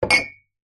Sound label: cups mugs x2 set down table; household; zapsplat